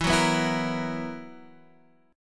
B Major 7th Suspended 4th, guitar chord

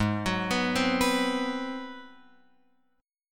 Ab 7th Sharp 9th, Guitar, Open F; arpeggiated

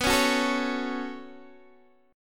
B 7th Suspended 2nd B down Guitar Open D

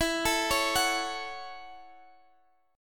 F sharp Minor 7th E

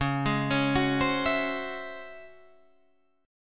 Db Minor Major 11th, Guitar, Drop D; 11,11,11,11,13,12; arpeggiated

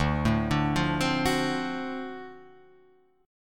D 7th Sharp 9th D; D7#9 arpeggiated; x,x,0,2,2,0,2,3; JGuitar

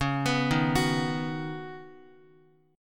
Db 7th Suspended 2nd Suspended 4th, Guitar; x,x,1,4,3,2; 4F,4A,5C,5G,5C,6E; arpeggiated; chord shape; JGuitar

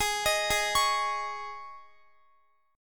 Ab Suspended 4th, Guitar; arpeggiated; Absus4; x,x,18,20,20,20

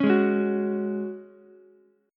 G sharp 7th Suspended 4th G sharp, Ukulele; G#7sus4 strummed; JGuitar

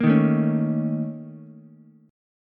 Bb Minor Major 7th Double Flat 5th, Ukulele; 3,3,5,4; strummed; chord shape

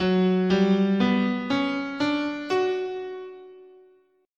F sharp Japanese, scale

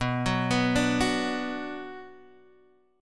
B Minor B Guitar Standard; arpeggiated; JGuitar

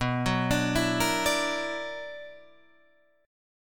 B-Minor Major 11th B down Guitar Drop D

